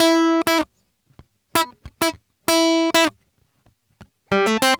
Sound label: GUITAR SAMBA